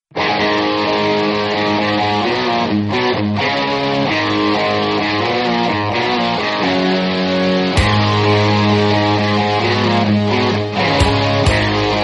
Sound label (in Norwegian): band musikk ringetone